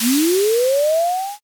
firework launch

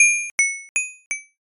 coin drop

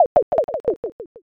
dice

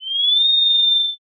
kettle

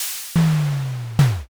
hatch

